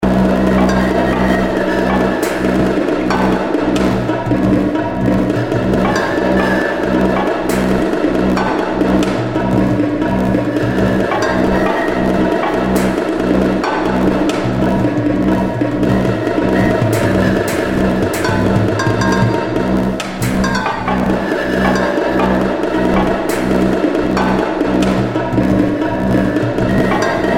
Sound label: Drums of hell; Decimation Mod; Music